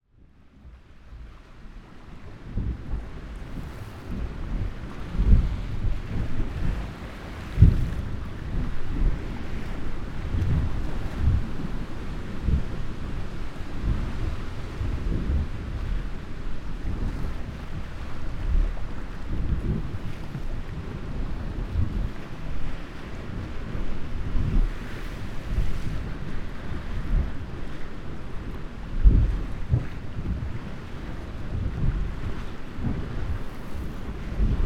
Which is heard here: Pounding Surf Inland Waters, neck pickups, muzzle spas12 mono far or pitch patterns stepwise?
Pounding Surf Inland Waters